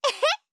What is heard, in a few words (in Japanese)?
攻撃